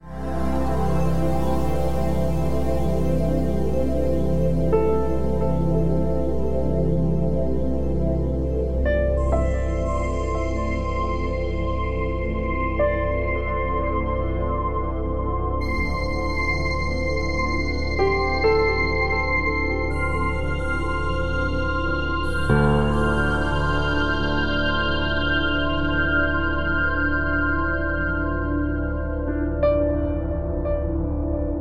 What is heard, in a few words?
Americana Ethereal